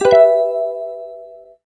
Block Chime; Sonic Foundry (Sony Creative Software); Club Joints and Street Anthems

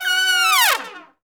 F#4, Section Horns

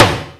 DDR30 SD; Crypton - DrumBase5000; RZ1

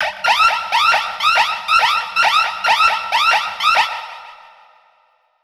Verb Proc B, alarm siren, 125bpm; musicradar

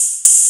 Cymbal; Sonic Foundry (Sony Creative Software); Trance NRG VOL-2; Drums; Cymbals

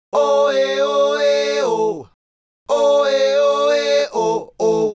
Male Group, Hip-Hop and RnB Vocals

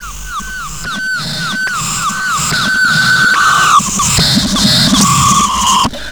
CYBER REV, REVERSE; Big Beat Samples & More; AKAI

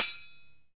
CHING; INDUSTRIAL

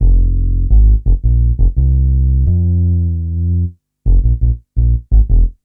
Upright Bass, Drum & Bass; Fluid Dynamics

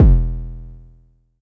Low Kicks E1; LIMAT Div Dept; Drums

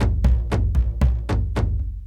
Kendong, World Percussion; Brekete

